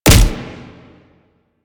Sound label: Fulmin Prime Punch, Weapons; Warframe